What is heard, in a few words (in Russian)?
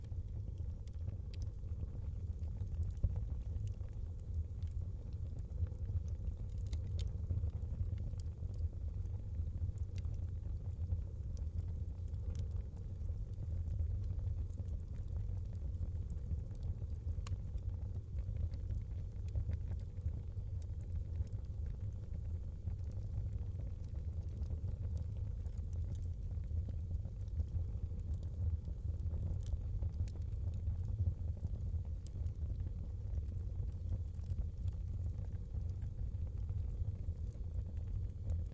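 огонь из трубы. камин ветрено дрова трещат внутри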